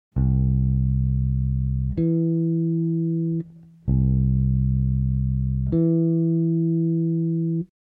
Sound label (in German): große Dezime